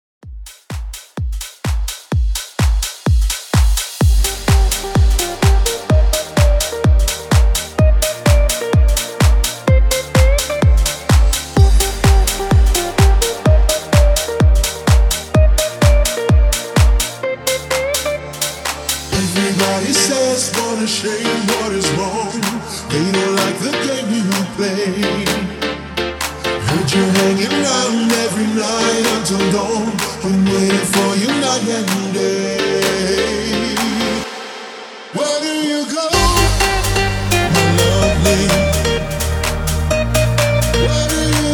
REDRUM 127 BPM

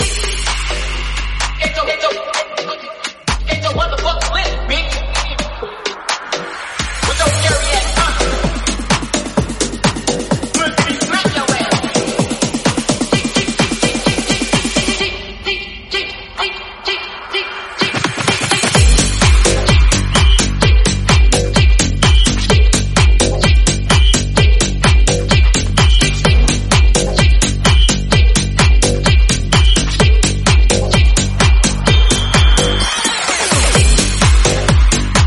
128bpm Gm

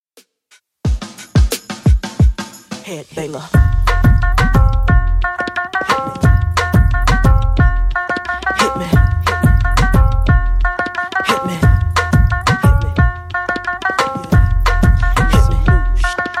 Throwback Rnb 89bpm

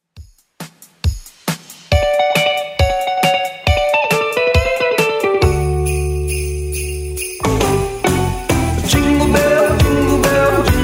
Christmas Classic Redrum 137bpm

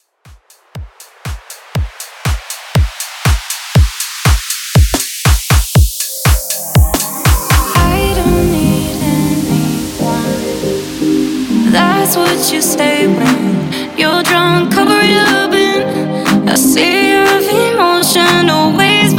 Re Drum 120Bpm; remixplanet

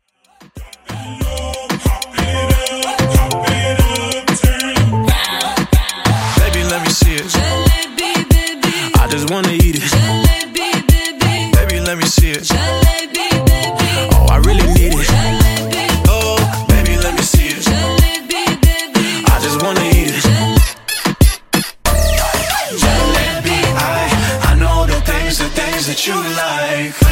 breakz intro outro tiktok 93 bpm

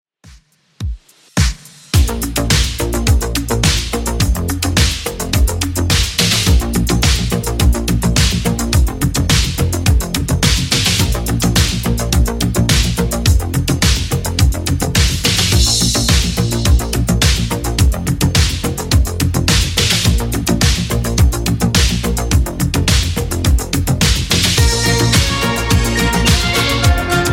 80s Redrum 106bpm

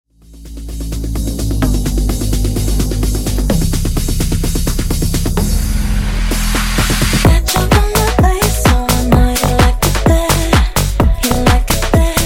Funk Remix 128 Bpm

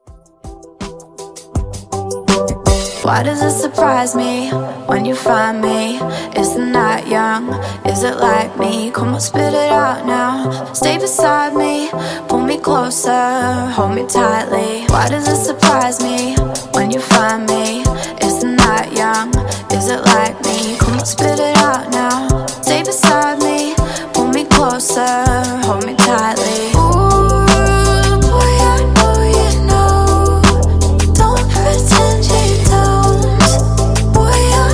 Dj Intro Outro 81 Bpm

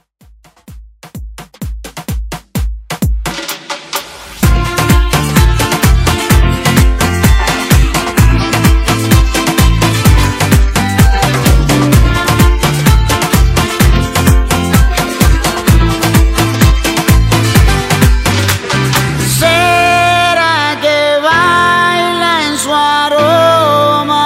Afro Redrum

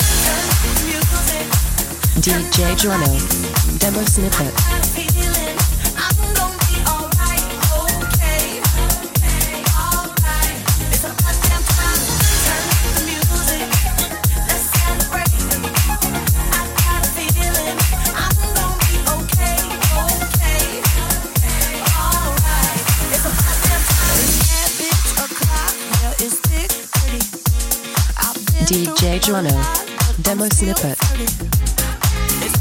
118 bpm

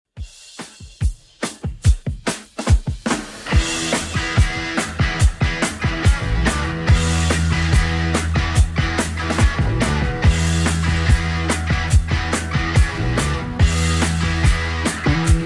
60s Redrum 143bpm

